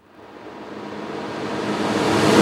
Sweeps Medium

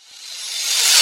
Cymbals Reverse; Vengeance Samples

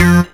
Synth Sound; Vengeance Samples; VEE Synth Shots

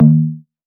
Synth Cuts; Vengeance Samples; VES1 Instruments

